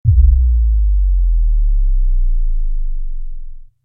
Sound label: Short impact; کوتاه ضربه ای